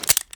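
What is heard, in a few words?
charge reload fast ejector rod, pistol; gmod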